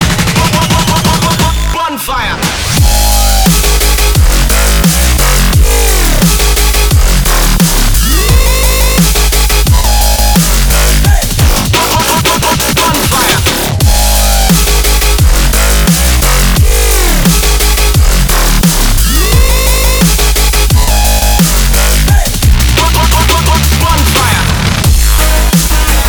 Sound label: Dubstep